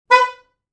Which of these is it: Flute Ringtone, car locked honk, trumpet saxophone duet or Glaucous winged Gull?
car locked honk